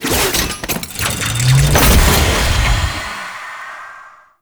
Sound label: Forge Enter; Warframe; Railjack; Player Ship